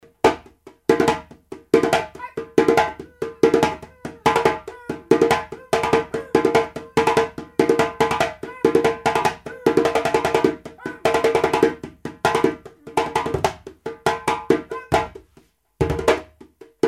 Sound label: djembe